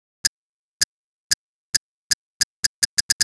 grenade timer, weapons foley; Spearhead Demo; MacFormat 2003 June

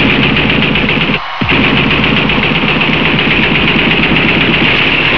bang bang

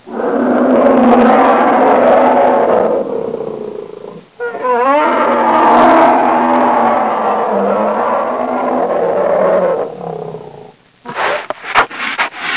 roaring, forest animals; Sound Effects for Multimedia - Volume 1

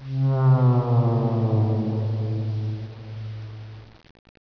Creepy; WARLOCKSNDS

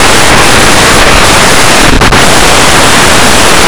mail; Blue Heat

